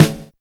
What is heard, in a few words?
HIP SNARE; Drums In Your FACE!